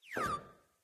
gamble eggs drop; Dragons Myth

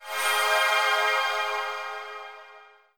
level up menu; Skillzgaming; gems odyssey 92

